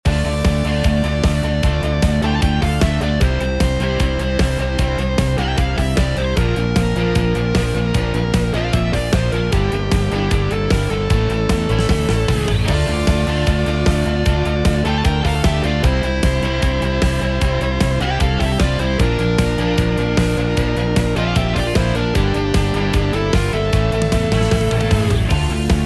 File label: Midi Yamaha